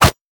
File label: two shivs; jester